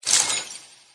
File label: Gem Remove